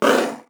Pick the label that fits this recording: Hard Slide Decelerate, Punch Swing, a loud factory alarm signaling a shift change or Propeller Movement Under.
Hard Slide Decelerate